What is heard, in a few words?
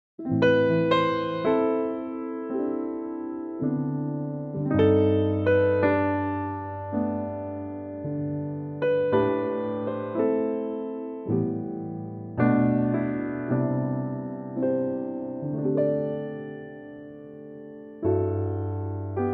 G piano trak